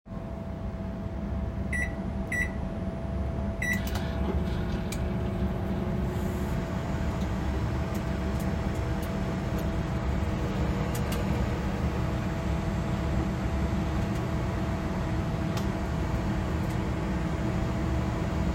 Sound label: Microwave